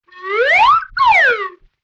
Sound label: Slide Whistle Up Down; Vintage Cartoon; Musical Elements